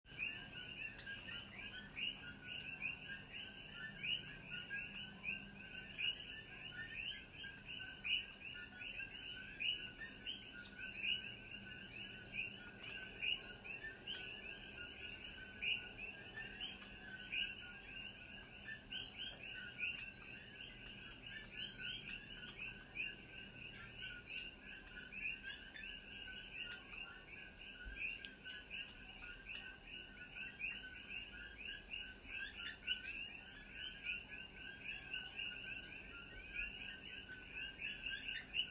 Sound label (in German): Vogelgezwitscher; Captain Cook